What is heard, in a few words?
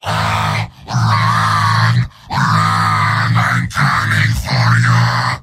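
yell, robot; heavy; mvm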